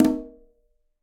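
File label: Conga C Flam Open, Latin Percussion; Drums; Drum Hits; Ableton; Factory Packs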